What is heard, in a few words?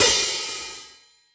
HH OP, Drum Dry; East Collexion; Drum 1